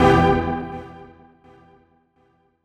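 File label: deep house FX, 125 bpm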